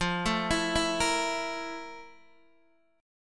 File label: F Major 11th, Guitar, Open D